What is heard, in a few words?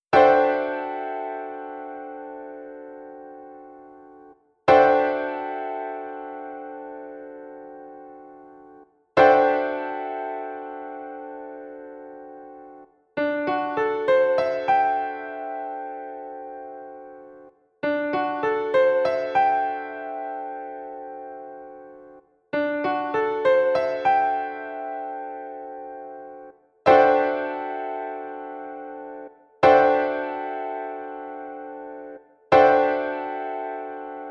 D11 Piano Chord